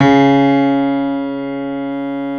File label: Grand piano C#2 (R); Giga Samples Collection